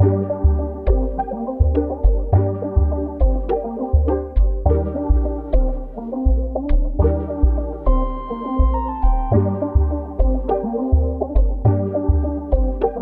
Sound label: 103 BPM G Min, Melody Loops - Afrobeat; Roll Off